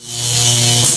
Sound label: sfx tesla zap; kids-tetris; Lab